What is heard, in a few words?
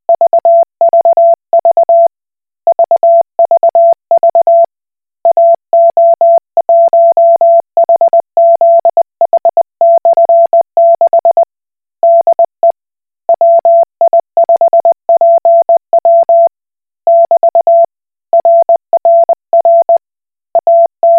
Koch Morse